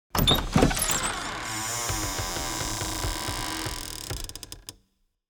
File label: Door - Medieval Open; Portals; SoundMorph